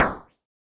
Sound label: Operator Snare; Instruments; Percussive; Ableton; Synth Essentials